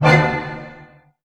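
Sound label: A diminished pianissimo, orchestra hits; Sound On Sound - Peter Siedleczek's Orchestra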